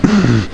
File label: MOAN